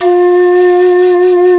shakuhachi F2; InVision Interactive; Lightware VOL-1; Instruments & Percussions

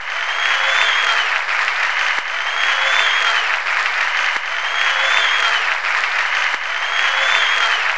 CROWD FX; X-Static Goldmine 2

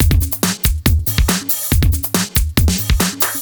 Break Beats, 140 BPM

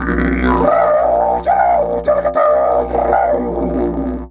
B1 WILD, Didgeridoo; Big Fish Audio - Didgeridoo and Other Primitive Instruments

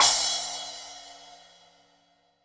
DW Green Maple Splash Cymbal; Sonic Foundry (Sony Creative Software); Vital Drums

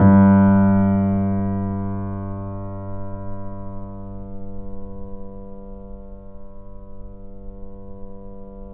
PP G 1, Grand Piano off mic; AKAI S6000 CD-ROM - Volume 2

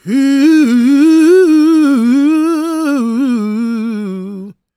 GOSP MALE, SOLO; Spectrasonics; Vocal Planet

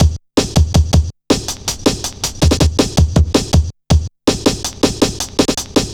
Boy, Drum Loops, Jungle, 161.5

